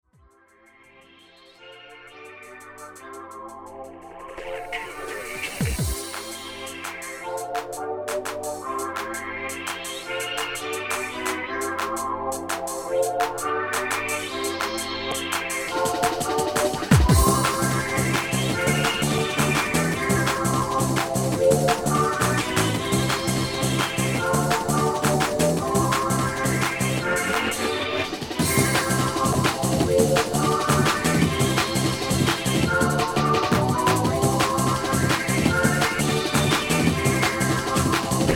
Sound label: Trance Breakbeat